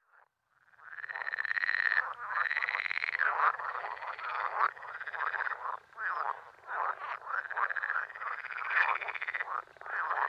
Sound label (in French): Grenouille verte